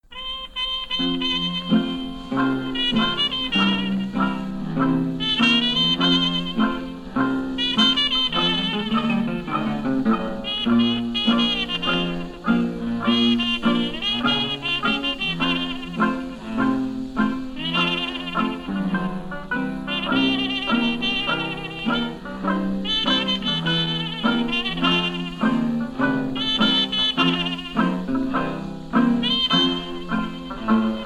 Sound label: String Band; frenchys String Band Sunshine Special